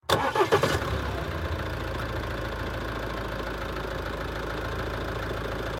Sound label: Engine; Cherricar